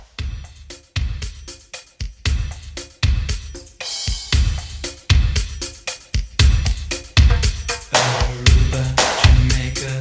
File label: Re Drum